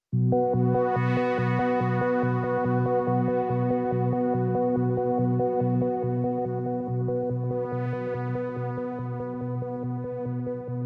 Pads and FX

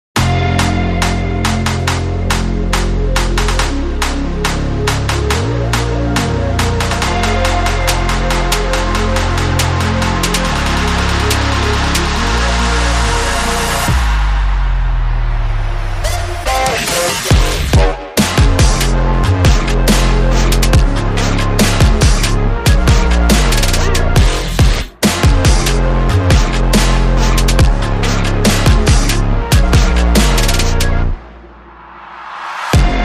no lead synth

